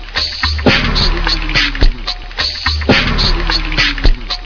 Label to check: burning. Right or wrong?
Right